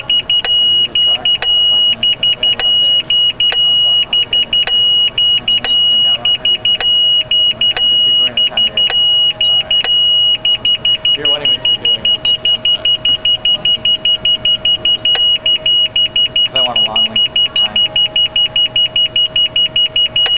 magent sensor

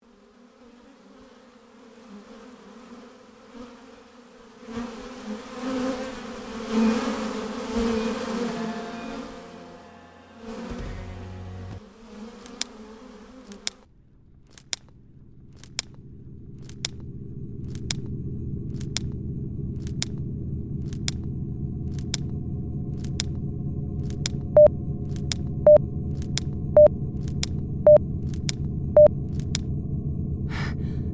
march

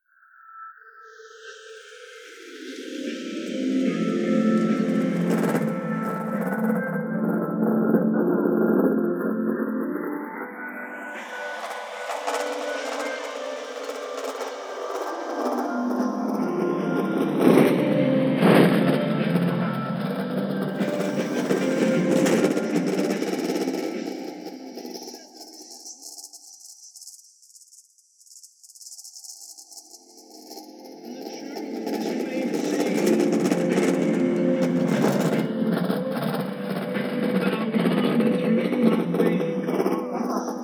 Shuttle

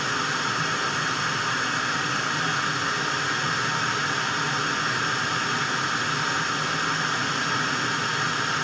tv noise

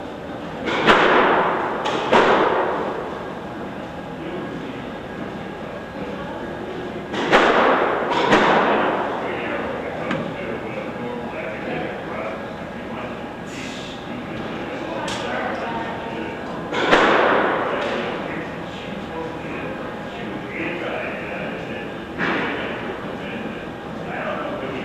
raquetball

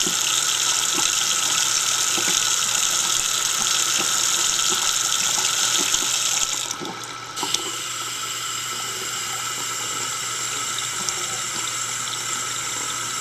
sound faucet

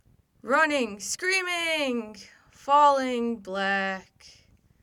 running screaming falling

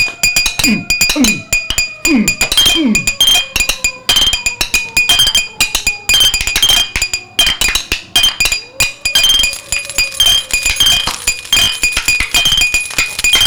clank

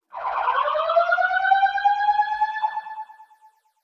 Siren

